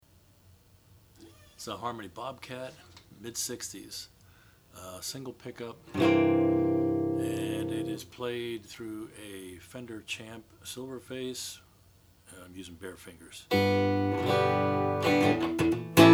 harmony bob kat h12v sunburst, Guitars Electric Solid Body